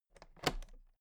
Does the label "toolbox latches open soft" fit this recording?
Yes